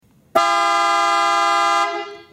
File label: piaa automotive horns